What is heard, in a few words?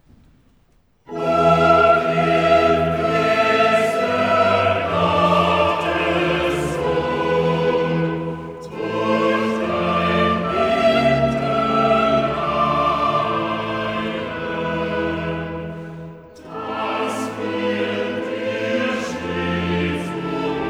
Chorale; O hilf, Christe, Gottes Sohn